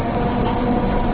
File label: drive, vehicle